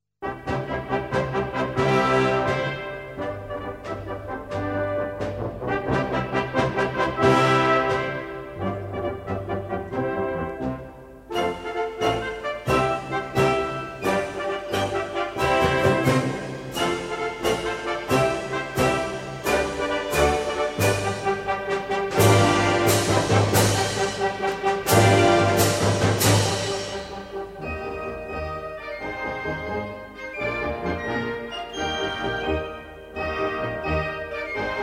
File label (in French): Marche Garde Waterloo; Napoleon; hymnes Napoleon